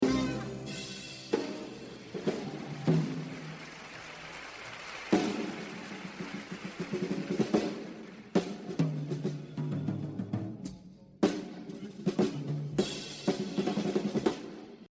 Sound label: Jazz Drumming; The Evolution of Jazz Drumming (Gottlieb)